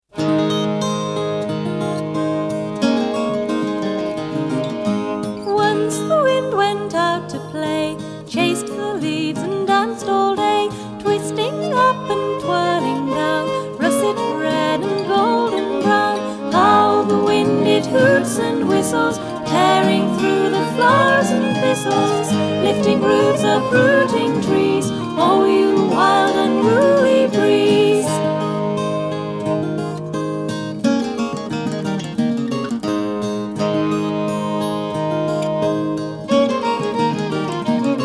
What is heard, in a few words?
Hoots And Whistles